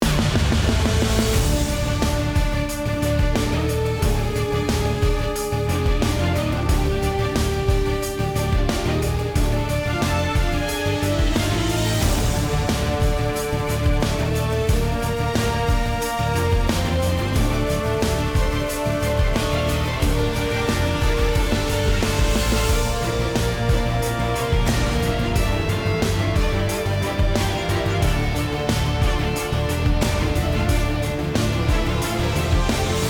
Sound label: battle arena, bgm